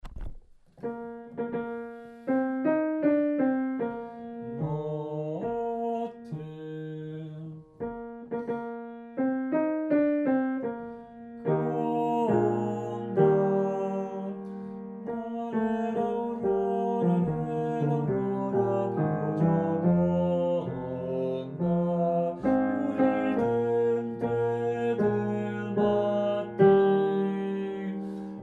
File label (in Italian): baritoni; O felice o chiara notte